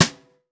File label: FX Snare; Drums; Ghosthack - Free Snares 2023